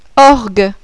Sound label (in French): ORGUE, À VENT (MUSIQUE)